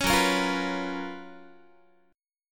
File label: F sharp Major 11th Guitar; F#M11 Chord; strummed; 16,14,13,15,15,x